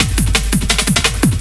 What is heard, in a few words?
loop, 170 BPM, drum & bass